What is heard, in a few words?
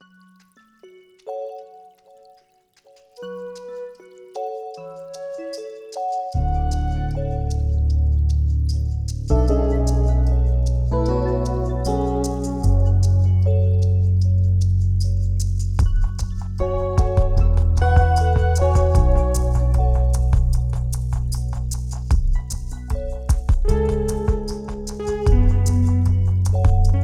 CALM music